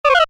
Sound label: Computer Beep, Short; Multimedia; Stuart Duffield